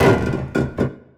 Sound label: piano FX scrape; Cartoon Film Effects